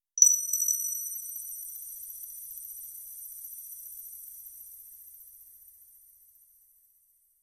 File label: digital coin drop sound; rewards